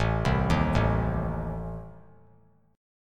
A Diminished A down; Adim arpeggiated; JGuitar

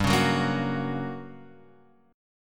G Minor Major 7th Flat 5th, G, down

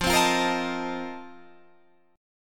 F 11th F, down, Guitar Drop D

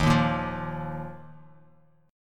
D sharp Suspended 2nd D sharp, Bass Standard; strummed; chord; 11,6,8,10; JGuitar

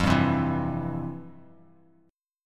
F Major 7th Suspended 2nd, Bass Standard; strummed; JGuitar

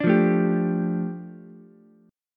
F 6th Add 9th F down Ukulele C6, chord; F6add9 strummed

